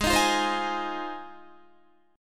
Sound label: G sharp 6th, Guitar; G#6; strummed; 16,18,15,17,15,15